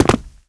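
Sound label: land heavy, FOOT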